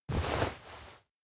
nod; anemoi

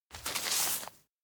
map point; awayuki; anemoi パッケージ版

